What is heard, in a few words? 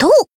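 Voice attack, Japanese; pizzica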